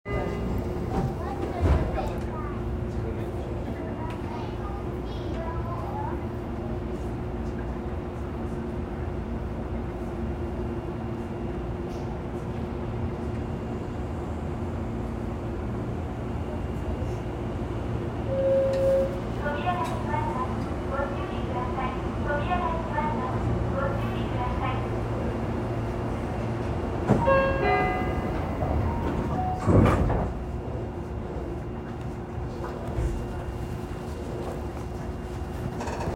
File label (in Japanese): 東急東横線学芸大学北, train sounds